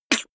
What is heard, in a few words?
beatbox snare harsh